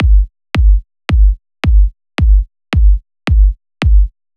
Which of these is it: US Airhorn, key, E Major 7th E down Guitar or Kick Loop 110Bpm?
Kick Loop 110Bpm